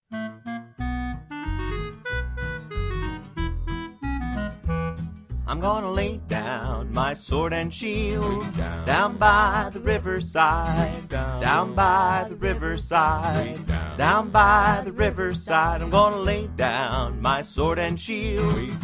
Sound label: vocal; Down by the Riverside